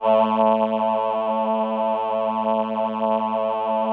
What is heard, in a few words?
VP-330, String Machines